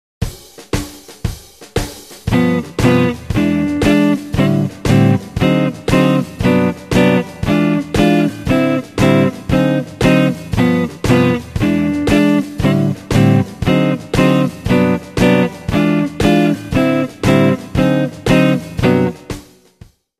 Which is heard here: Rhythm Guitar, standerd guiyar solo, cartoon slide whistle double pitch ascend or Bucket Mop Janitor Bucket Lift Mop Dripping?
Rhythm Guitar